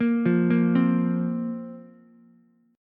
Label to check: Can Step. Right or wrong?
Wrong